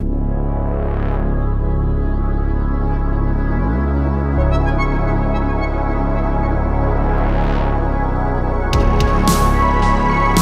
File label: Synthwave; Retrowave